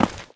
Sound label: step; slender; chaosinsugunner